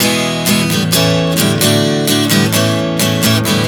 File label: E-A-D-A 130 bpm, acoustic guitar chord progressions